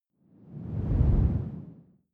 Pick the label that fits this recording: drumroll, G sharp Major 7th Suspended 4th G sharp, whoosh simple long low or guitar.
whoosh simple long low